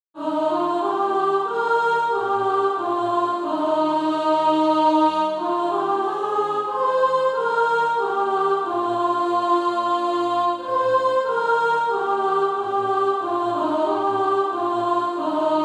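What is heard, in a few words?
soprano, Practise Music; Fear Not the Angel Said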